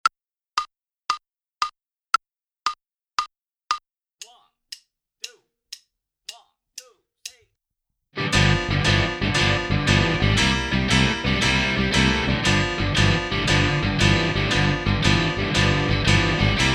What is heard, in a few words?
Minus Drums; Inst Ensemble